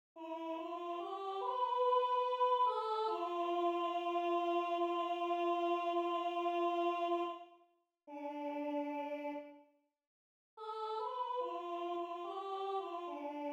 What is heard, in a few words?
alto